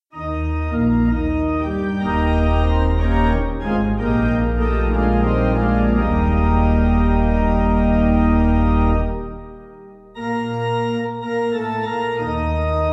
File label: Pipe